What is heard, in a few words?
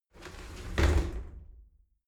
shower slide doors close regular; objekte; tueren